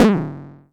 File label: percussion, drum machines, FutureRetro FR777